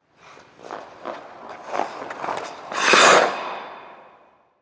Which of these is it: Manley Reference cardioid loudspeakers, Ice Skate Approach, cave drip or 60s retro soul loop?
Ice Skate Approach